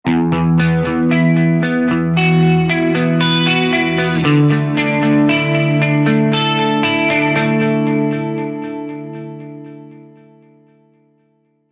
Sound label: fine grit; linpodx3